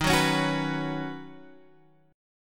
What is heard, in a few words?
Eb Minor 7th Sharp 5th, down; strummed; chord; JGuitar